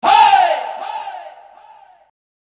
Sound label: Hoi, Dj Sample